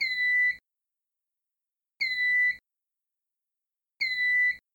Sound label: Panel Chirp Trouble; EST3